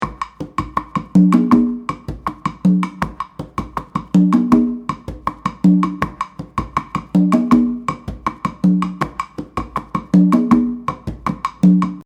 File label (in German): Rhythmen Congaspiel binaere Rhy Yambu Begleitung